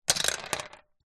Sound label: leisure toy bingo ball drop out of plastic cage into board; zapsplat